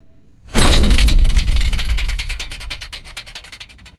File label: sharp shocking impact with metallic rattling; designed